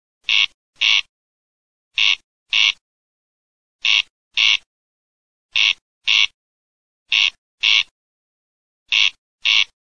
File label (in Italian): RE DI QUAGLIE